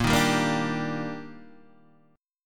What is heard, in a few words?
Eb Major 7th Bb